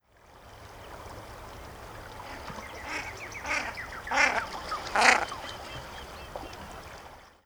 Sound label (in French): orn tadorne de belon